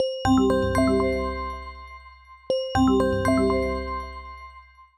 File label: timer; homepod OS